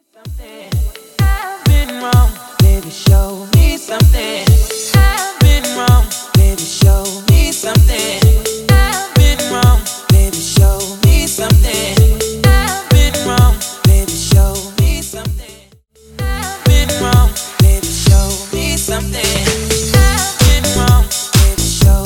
Re Drum 128 BPM

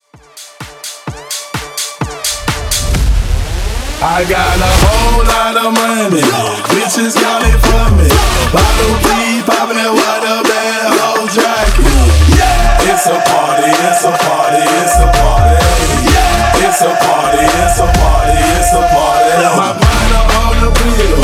Party Bass House Rework 128 BPM

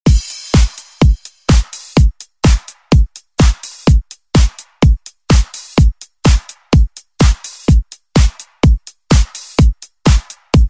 Rock Redrum; Deejay Hit

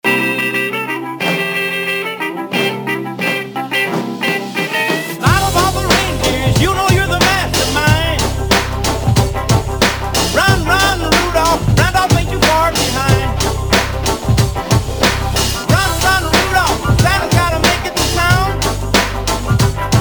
Classic 50s ReDrum

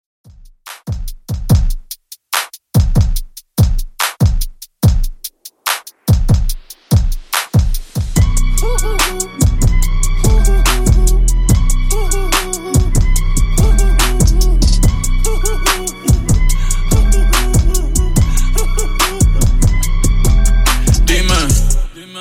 Top40 Redrum 72bpm Dirty

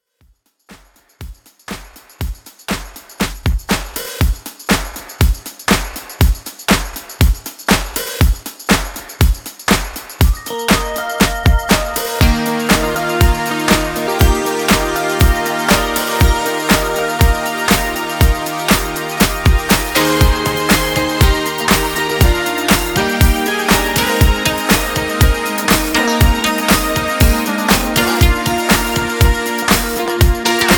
Redrum

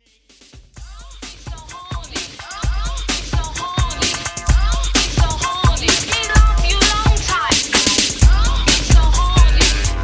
HipHop Old School Megamix

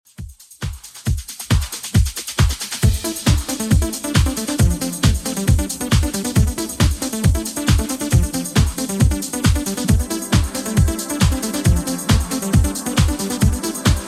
90s Redrum 136 bpm

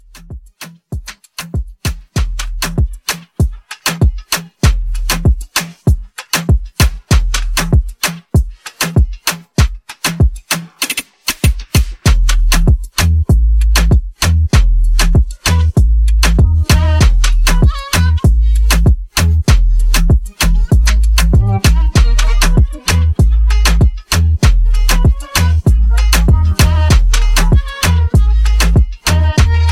Reggaeton Club

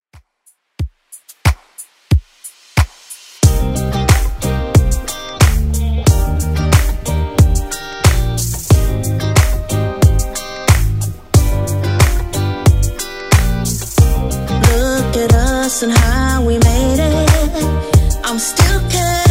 70s 93bpm; Redrum

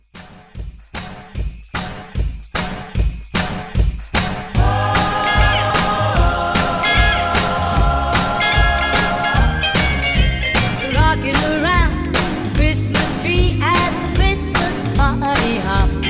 Christmas Re Drum 150

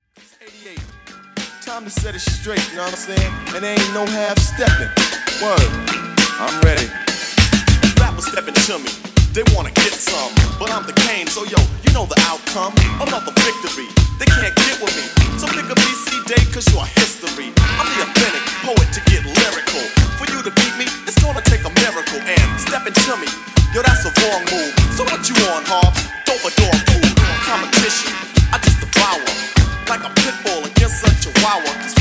80s Redrum 100Bpm

